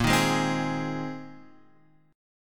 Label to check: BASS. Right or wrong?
Wrong